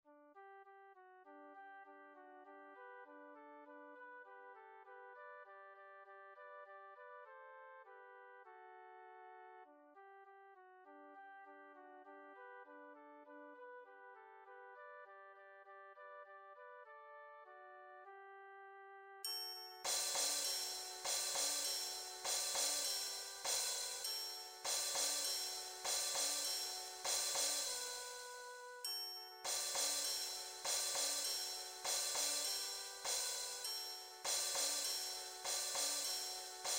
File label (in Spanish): PERCUSION; Viva el Carnaval